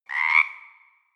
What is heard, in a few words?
frog call croak